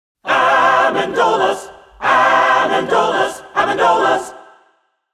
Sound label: Jingle Accapella; Amendolas